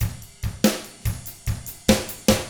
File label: ROCK 6-8, Drums; ILIO; Double Platinum Drums 1